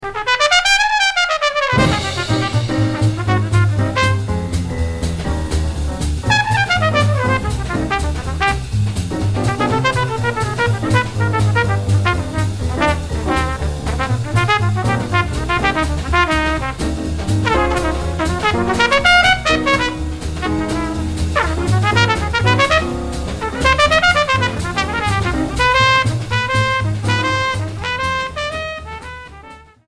solo

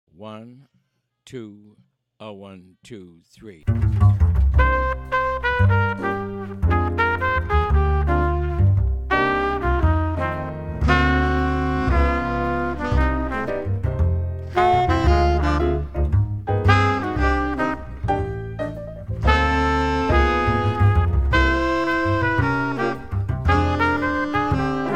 Sweet Talk minus Drums, jazz

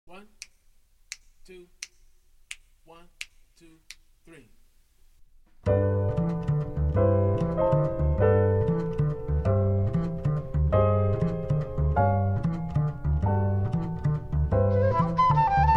minus drums

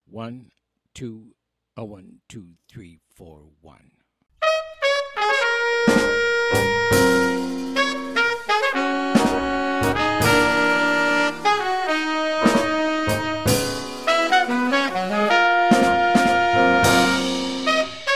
minus bass

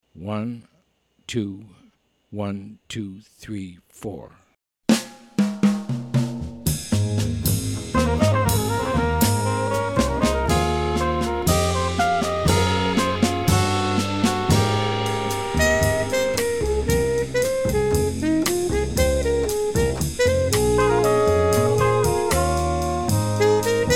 minus guitar

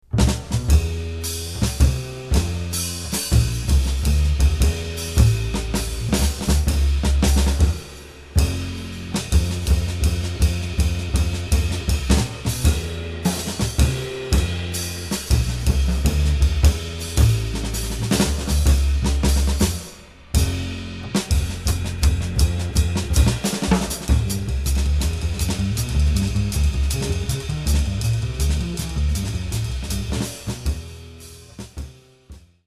no piano trio

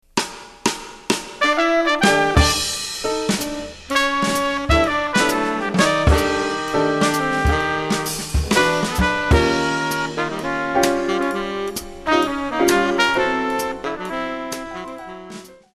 Monks Moments no bass, jazz

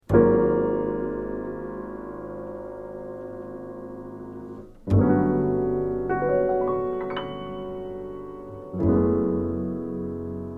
minus vocal

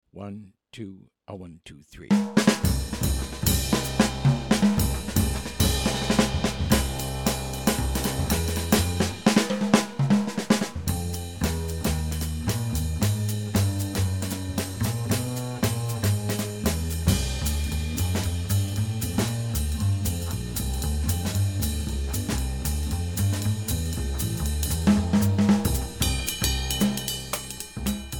Trio bass drums only

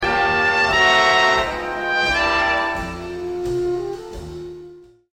brass

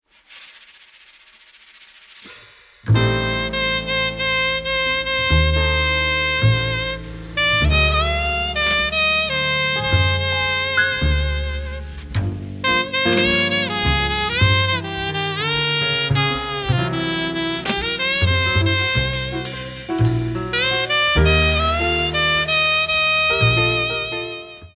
solo

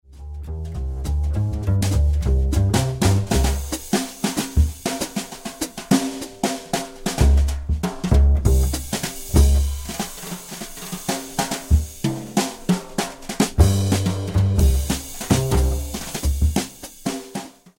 minus Piano

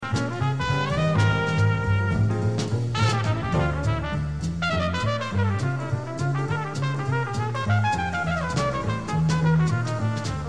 solo

